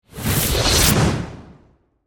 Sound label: Magic Spell Pass By, Horror, Magic, Spell, Pass, By, Whoosh